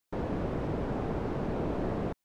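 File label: Synth Filter lowpass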